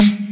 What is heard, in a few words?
PERC; Loopmasters; The New Sound Of Dubstep; DKD DRUM HITS